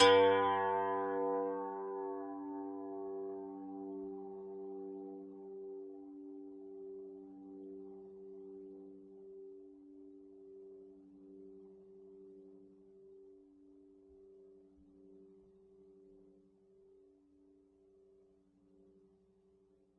ZITH G2, zither; multisampled; world

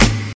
snare

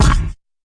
fx drum; Electro FX

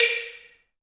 african pe lo

